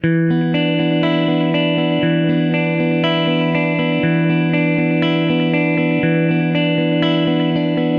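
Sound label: Guitar Alternative E minor 120bpm; Guitar and Bass; Ableton; Factory Packs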